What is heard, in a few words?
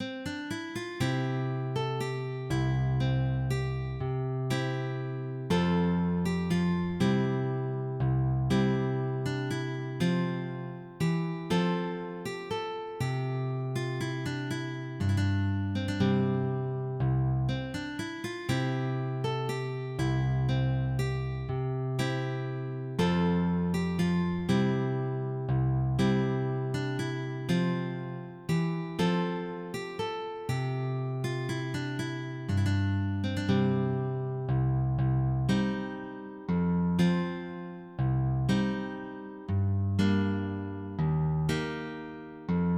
courante Sarabande